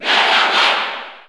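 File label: Cheer English, crowd; Meta Knight; SSB4; SSBU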